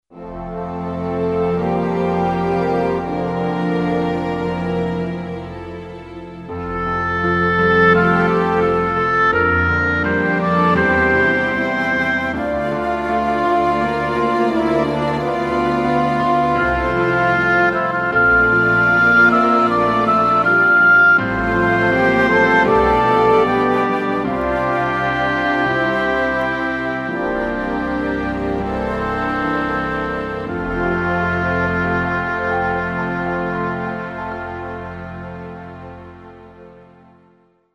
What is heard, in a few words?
Flute